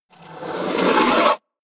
sandpaper grind

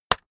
keyboard external enter hit push

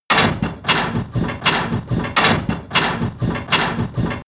industry machine mechanic metal fast

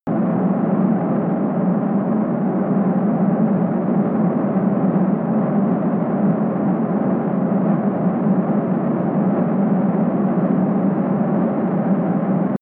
missile small fly loop distant

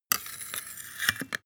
sword scabbard handle pull soft

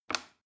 plastic button small release regular

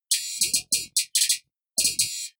typewriter

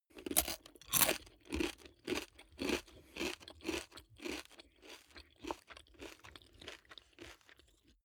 eat potato chip mouth closed normal

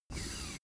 office chair hydraulics up smooth

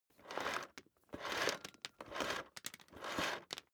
vacuum cable drag out sequence

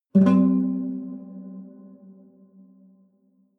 low string buzz dissonant double

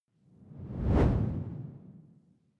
whoosh simple hard low

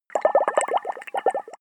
bubble fast short

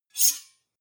two knives large scrape fast hard

